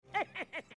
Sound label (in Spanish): risa